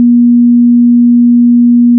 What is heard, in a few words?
sound 240Hz